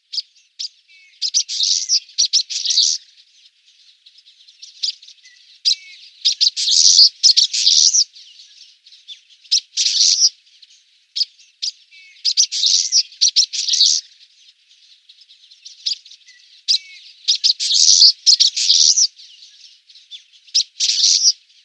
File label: Common Waxbill song